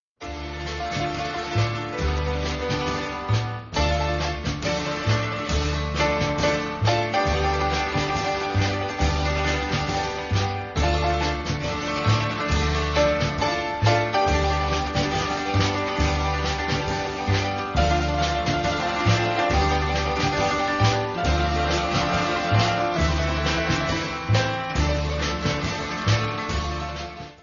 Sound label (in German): melodie orchestrion; olprinz; filmova hudba; wilder westen heisser orient